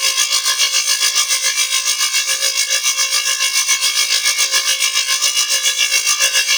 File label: Sentient Security Camera Detection Laser Loop; Ambience; Warframe; Sentient Devourer